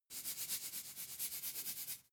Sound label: salt shake